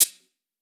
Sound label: Perc Shaker Wide, 909 Percs, Vintage Drum Machines; Slate